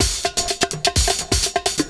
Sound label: PERCS, 70 s Breakbeats